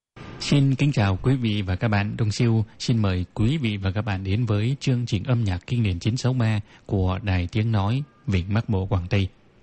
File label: Vietnam classick song